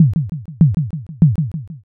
slot stop

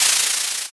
cloth rip

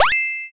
surprise

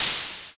air release

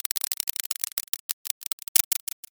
roulette

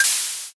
can open

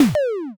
knockback

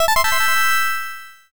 join

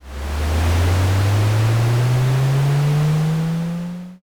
airplane

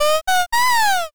rooster

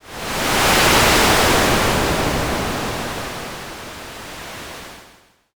wind

